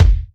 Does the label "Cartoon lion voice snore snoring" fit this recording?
No